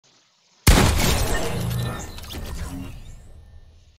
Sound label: spas 12